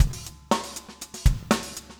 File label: funk 120 bpm, loops